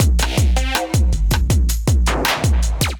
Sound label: Kit Melodic 160 bpm, Drums; MIDI Clips; Delicate Chaos Kit; Ableton; Factory Packs; Skitter and Step